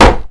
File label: wood; Mafie 1